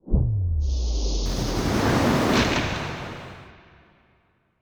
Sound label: Death Extra Details